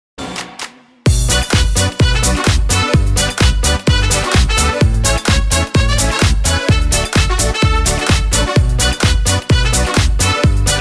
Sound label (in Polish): klubowe zm